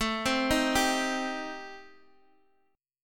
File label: A Minor 7th Flat 5th A, Guitar, DADGAD